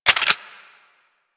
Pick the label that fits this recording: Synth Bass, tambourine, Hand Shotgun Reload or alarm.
Hand Shotgun Reload